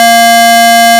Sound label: sine steady overflow